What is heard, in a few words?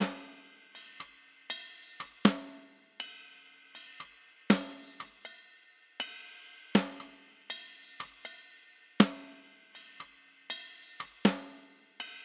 Polymeter; Metre (music)